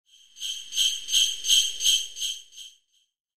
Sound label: jingle sound; cookie clicker